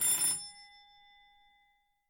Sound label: doorbell